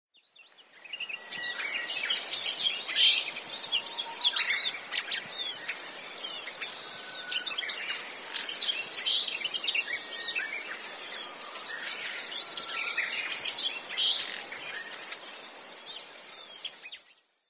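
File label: taiga flycatcher, sakata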